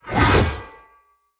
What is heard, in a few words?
Great Sword Throw; Weapons; Player; Swords; Mendicant Great Sword